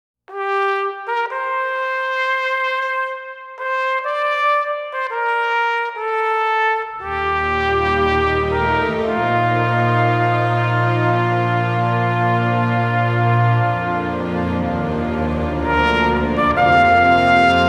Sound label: Trumpet; Macross Frontier